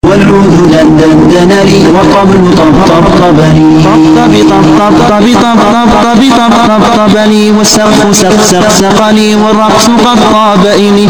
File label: cat bass; green skullcap